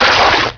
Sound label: m gibmeate; PC Gamer IT CD 41; Daikatana